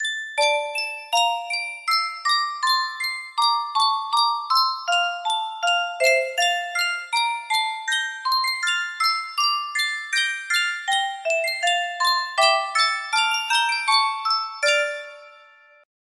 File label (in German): Yunsheng Spieluhr